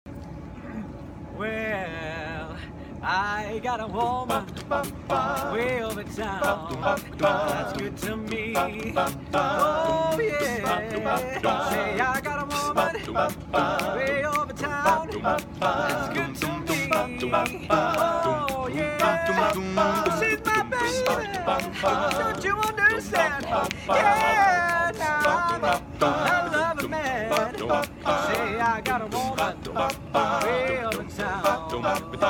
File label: Acapella Cover; Acafellas